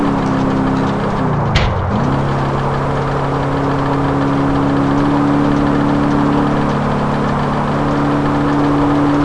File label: third cruise, vehicles; emperor